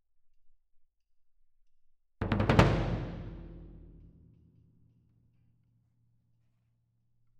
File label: Cassa Main, piano